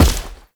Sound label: Heavy Step, Footsteps; Enemies; Foley; Warframe; Tau Dax